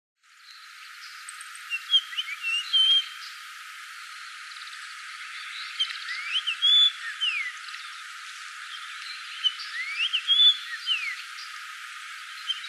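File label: Japanese Grosbeak, mie; ikaru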